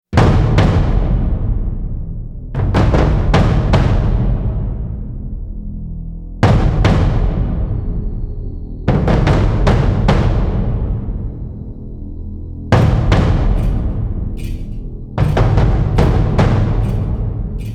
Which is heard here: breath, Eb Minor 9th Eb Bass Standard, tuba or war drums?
war drums